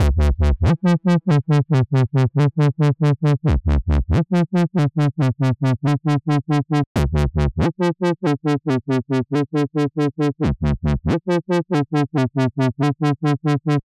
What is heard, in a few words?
Wobbler; Solilla; Akai MPC5000; Internal Rom 2